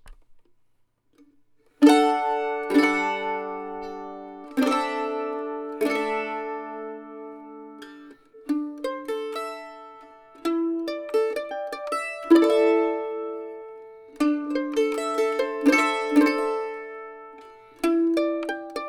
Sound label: Crosspicking